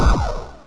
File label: laser; twitch-1.0